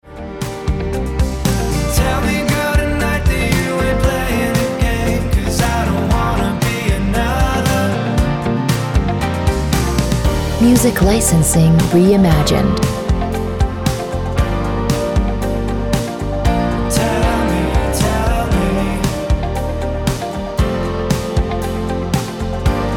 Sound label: Ringtone